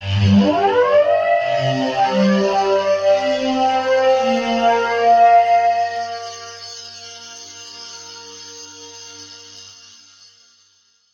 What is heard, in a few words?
pad